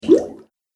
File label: Goop Sound, cartoon